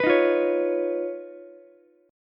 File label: D sharp 7th Suspended 2nd Sharp 5th Ukulele; strummed; JGuitar